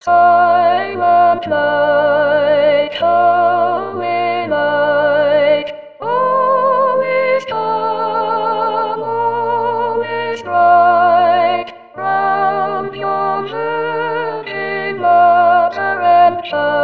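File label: Silent night Soprano; Reinecke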